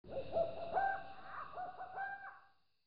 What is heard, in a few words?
night owl fade out